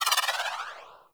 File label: melee charge turbo dash activate; Warframe; weapons